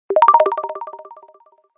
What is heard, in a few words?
Alert Modern Low; HTC Touch Diamond